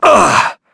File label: Vox Damage; en; voices; heroes; Shakmeh; kings raid